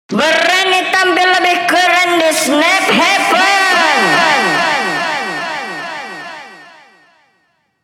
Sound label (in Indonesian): lagu vokal